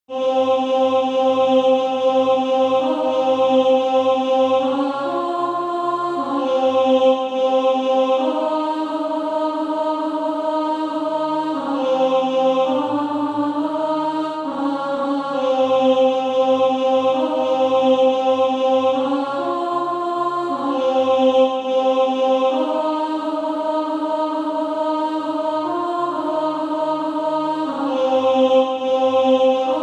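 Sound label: alto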